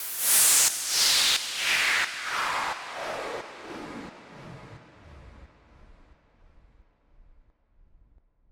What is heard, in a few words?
Swept Noise